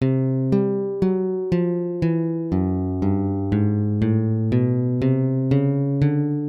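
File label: running bass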